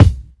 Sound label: focused bass drum sound b key, kickdrum tuned to the B note; kick drum samples